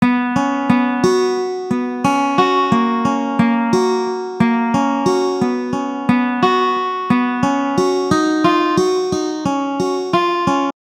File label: guitar